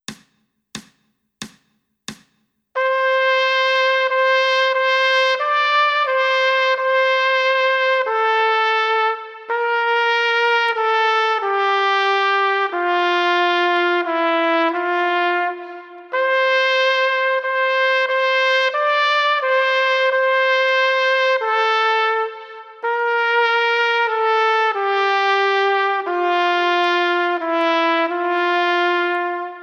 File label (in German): Es ist ein Ros entsprungen 1. Stimme